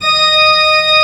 church organ d#4

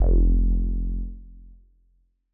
M Elec Bass F1; KG MegaSaur

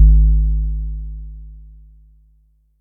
Sub, Yamaha DTXpress Electric Kick

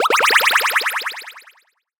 Sci-Fi Lasers - FX Sweeps; Antidote Advent

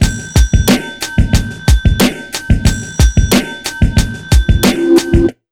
91 BEAT, Underground Hip Hop; USB Soundscan vol.02; AKAI

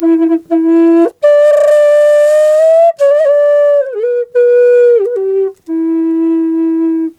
E wild flute; Big Fish Audio - Didgeridoo and Other Primitive Instruments

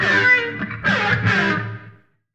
Guit Sqeek Funk; Sonic Foundry (Sony Creative Software); Ugly Remnants VOL-2

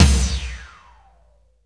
TOM FUNK; Roland LA; DRM Toms

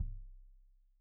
Kik Stereo; Deeply Sampled Drums

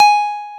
DX EP; INIS; Vintage

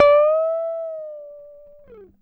GTR TRIK, Jazzistic; Univers Sons

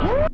Vox Woop; Lost Tapes From Mars

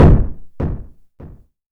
kick, Korg ER-1; Kit 14

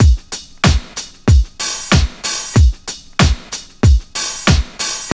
TRIP 90, trip loop

